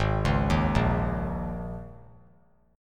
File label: G sharp Suspended 2nd Suspended 4th, G sharp, down; G#sus2sus4; arpeggiated; JGuitar